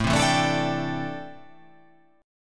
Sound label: A Minor 11th A, Chord